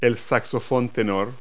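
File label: tenor saxophone; Multi-Lingual Talking Picture Dictionary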